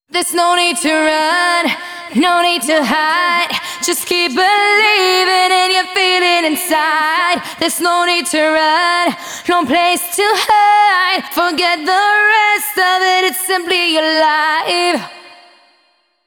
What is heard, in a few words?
Female Vocals WET, 128 BPM; Vengeance Samples; Vocal-Hook Kits; Song Kit; Keep Believing